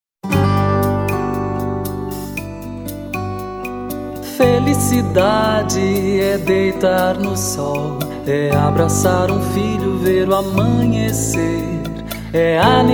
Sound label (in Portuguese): jingle; felicidade lojas colombo